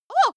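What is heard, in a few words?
female scared comic, screams; schreie; menschen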